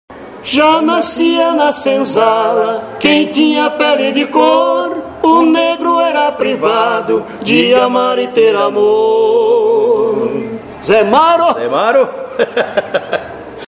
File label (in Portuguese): vinheta cantada